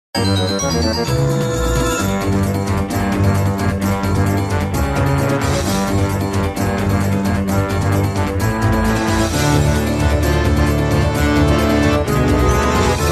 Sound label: Nervous Tension